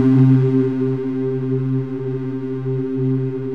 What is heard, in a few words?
CHORUS VOI; E-MU Formula 4000 Series Vol. 2